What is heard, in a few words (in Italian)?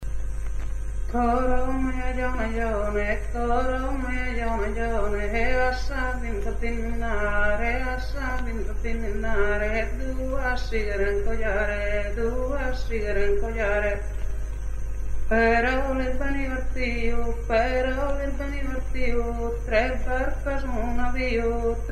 duru duru, canti monodici non accompagnati; orgosolo